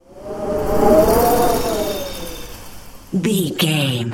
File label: wind blizzard storm spell light